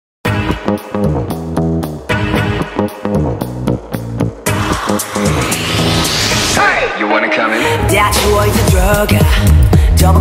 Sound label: sped up reverb